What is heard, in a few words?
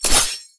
lox sword